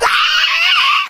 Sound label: squeal, infected die; npc; Left 4 Dead 2; Source Engine